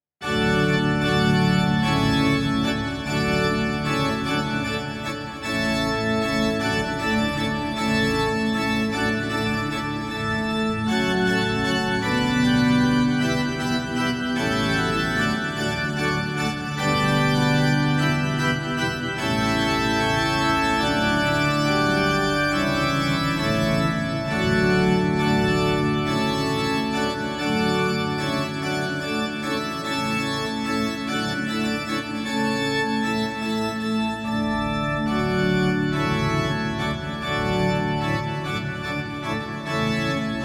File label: hornpipe